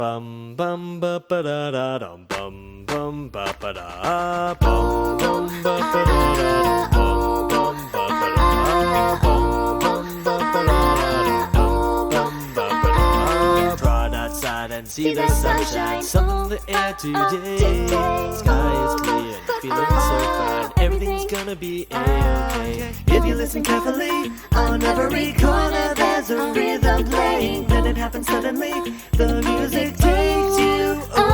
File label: Acapella; Pony Music Archive